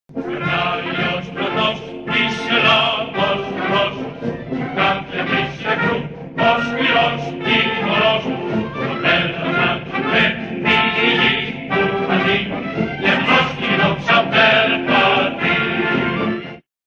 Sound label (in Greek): Παρέλαση